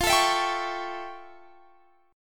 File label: F Augmented Major 9th, Guitar, Standard; strummed; x,20,19,21,20,21; down